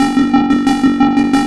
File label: RESO 12 VCF; CMP - Analogon